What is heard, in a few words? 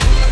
DANCE STAB; E-MU Formula 4000 Series Vol. 5; Protozoa; Orbit Instrmt